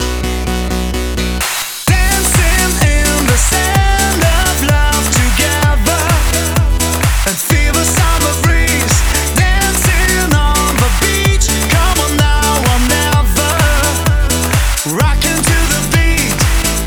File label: Male Mix, 128 BPM; Vengeance Samples; Vocal-Hook Kits; Song Kit